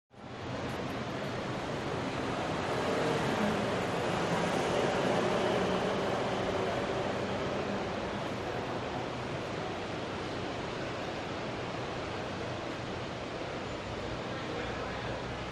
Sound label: Bus Station Walla Trf